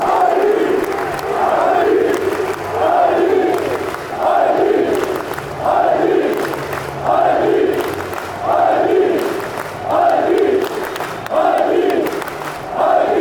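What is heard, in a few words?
athletic claps, football songs and soccer chants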